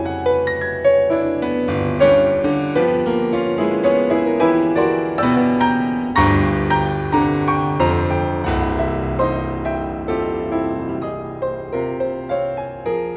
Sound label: Ballad